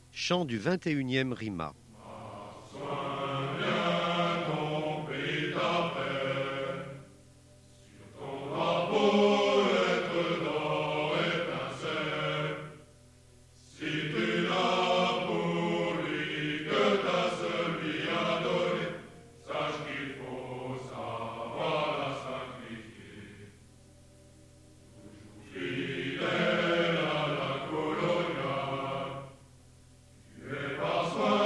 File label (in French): Chant; Troupes de marine